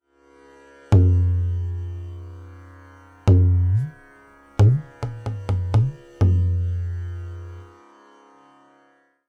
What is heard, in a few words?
TALAS Bol Syllable Gi